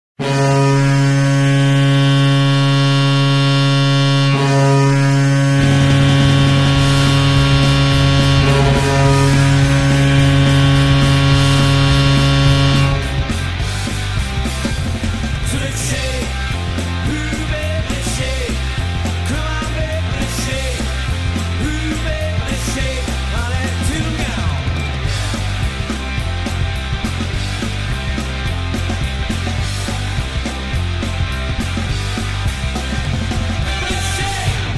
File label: goal horn; toronto maple leafs